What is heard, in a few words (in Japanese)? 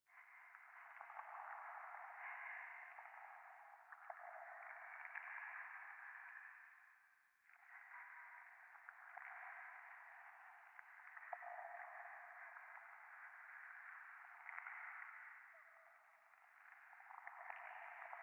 左耳快感吐息 触手